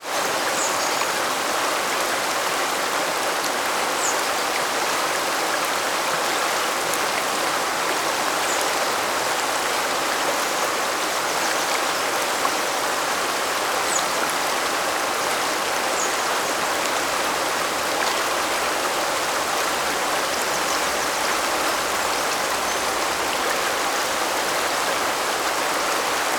water river shallow wide fast birds pyrenees mountains france